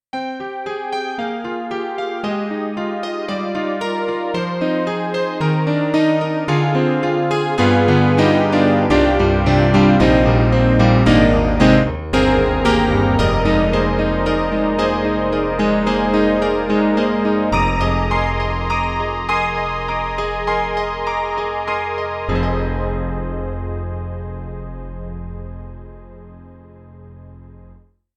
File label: Korg Triton-Rack Piano Layers